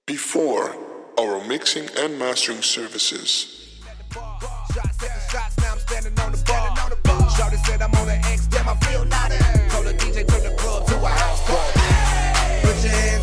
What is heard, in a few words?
hip hop; Mixing and Mastering Samples